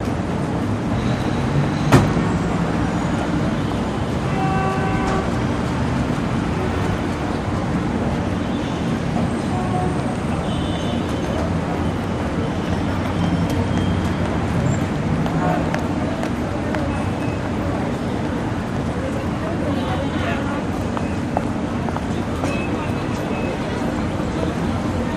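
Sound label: City Traffic Heavy Ambience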